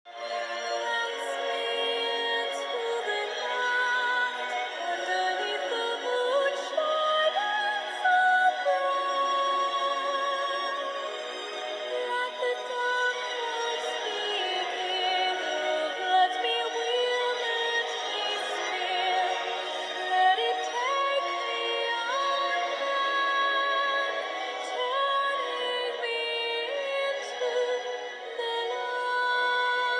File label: Dark Waltz